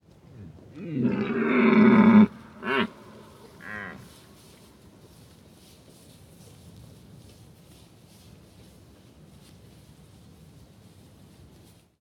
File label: Red Deer walking grass; Ivo Vicic; Red Deer and Roe Deer - Roaring, barking and other sounds; GDC 2018 - Game Audio Bundle